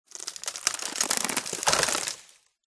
forest random movement creak; silverplatter audio

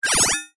game sound laser shoot futuristic

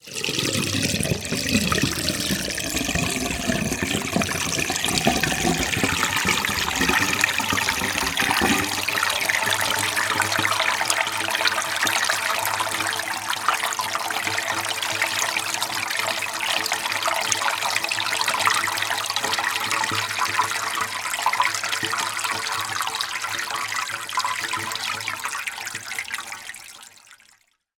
household water drain shallow bath; zapsplat

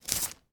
household cd or dvd remove from paper wallet

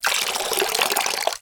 water pour shallow; sound effects one

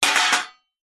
household baking tray metal impact drop

